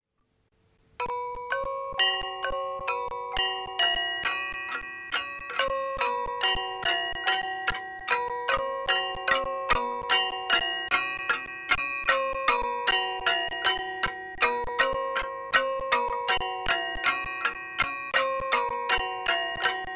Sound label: gambang Kunyar Kunyir; Asak